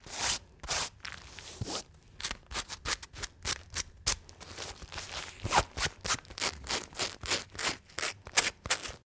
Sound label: Clothes scratching